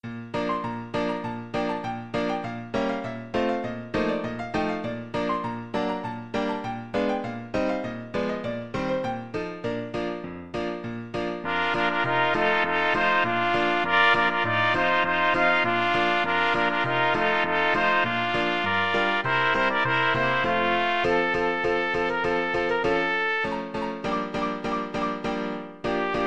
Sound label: three little maids, trumpet trio